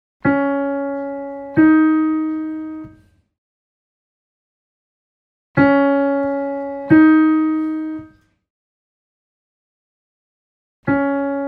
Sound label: piano